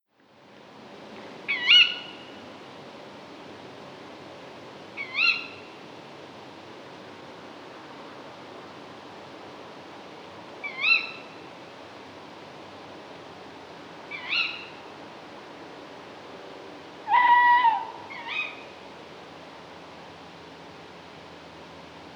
Tawny Owl, birdsong

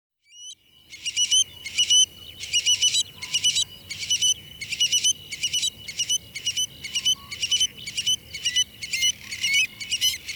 Stone Curlew, birdsong

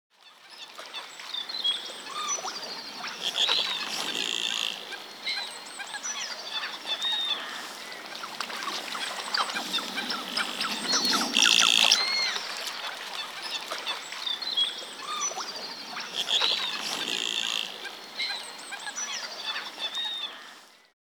Goldeneye

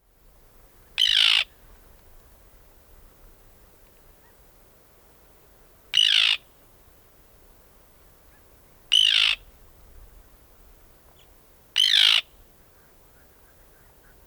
Arctic Tern

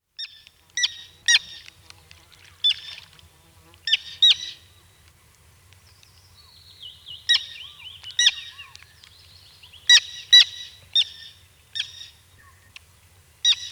Common Tern

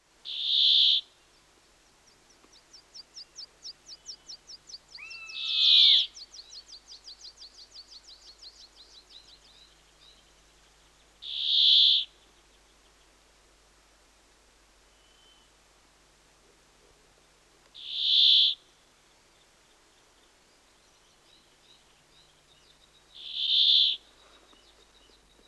Brambling

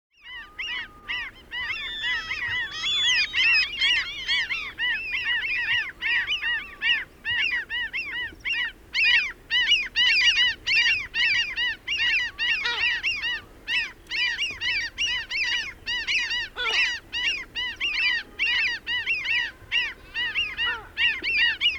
Common Gull

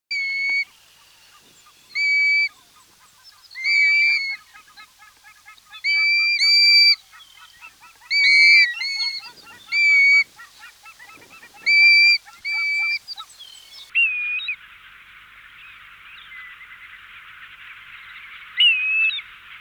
Grey Plover

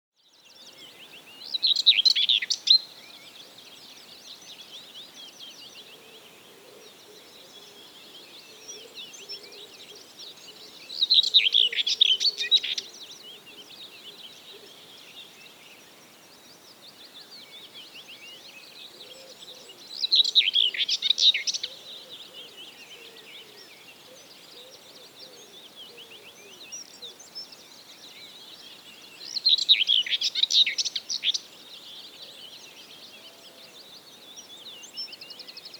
Whitethroat, birdsong

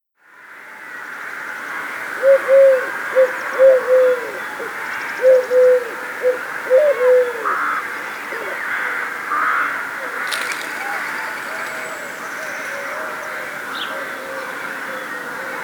Collared Dove, birdsong

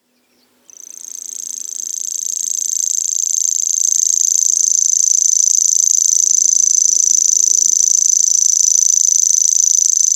Grasshopper Warbler, birdsong

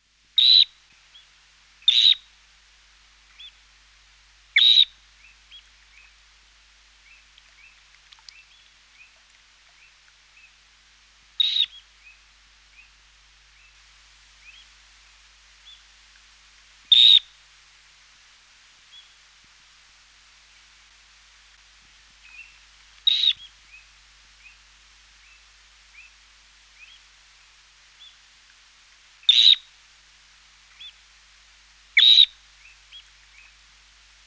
Dunlin

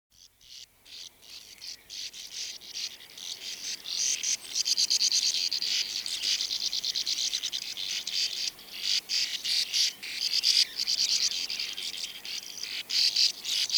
Sand Martin

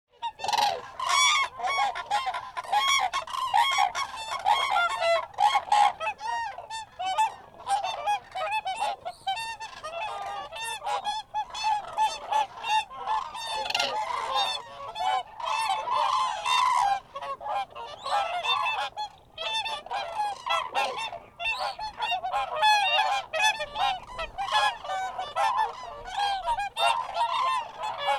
Crane

